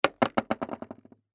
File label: skateboard kick